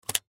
Camcorder Switch, Camcorder Switch Push; Technology; Sony Mini Dv; Electronics; Blastwave

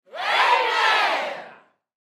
Human Crowd Studio; Crowd Reactions Amen Loud; Sound Effects:Voice

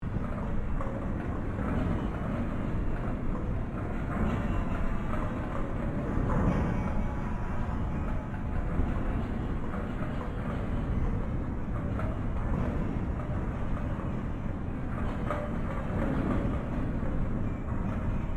Created ambience daemonic machinery loop; Various Ambience created through sound manipulation in FMOD; Artificial

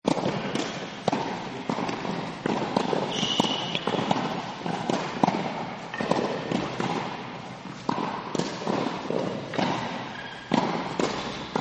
Tennis Drills; Sports; Partners In Rhyme

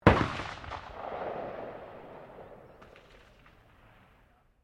RIFLE UNKNOWN FIRING DISTANT; Rifles, non military; Rifle Sound Effects; Guns

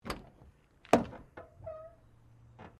CAR MAZDA RX7 SERIES3 EXTERIOR DOOR OPEN

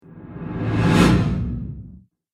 Cinematic Reverse Whoosh; Whoosh Sound Effects

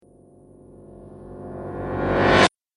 Magnetic Magic, magic sound effect; Role Playing Game; Andrew Fleming

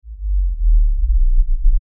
Lfe Rumble By Swell Low Bass Movement

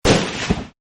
AK47 Shot; Assault Rifle Sound Effects; Guns

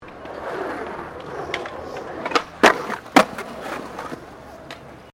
SKATEBOARD FLAT JUMP; Skateboarding; Sports

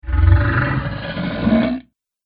Dinosaur Snarling; animal

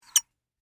Bolt Tighten Single, Screw, Squeak; Machinery; BlastWave

